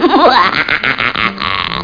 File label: trick; tritus